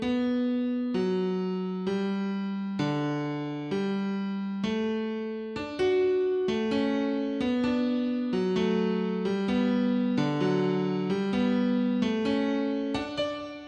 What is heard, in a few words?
canon alarm; Electronica